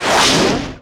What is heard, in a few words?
Menu Enter; Menu FX